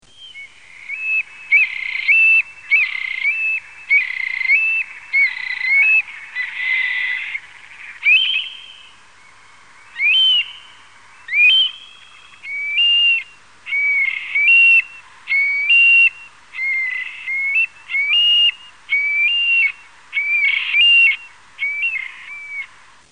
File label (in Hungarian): Ugartyъk; Madarak